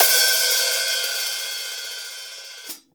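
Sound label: RAW overhead, Drums Acoustic; AKAI; 90s sample CD; USB Soundscan vol.10; RAW KIT 5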